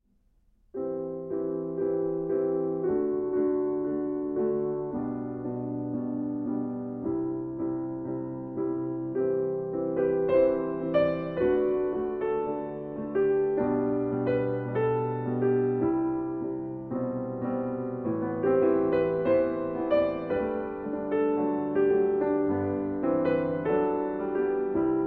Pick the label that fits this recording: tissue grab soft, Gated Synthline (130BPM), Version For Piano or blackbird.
Version For Piano